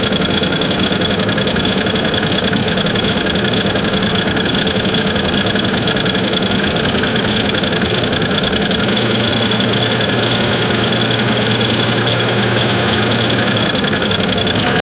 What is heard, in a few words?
engine gear machine